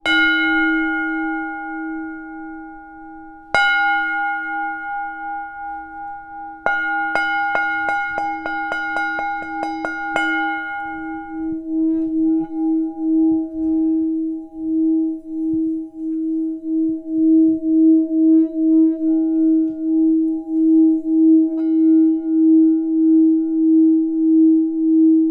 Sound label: ringing bowl